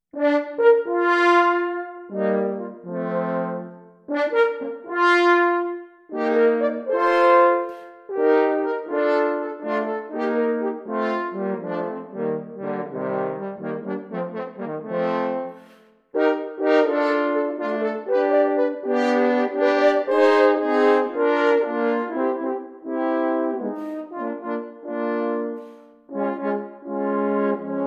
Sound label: Triade, 120 bpm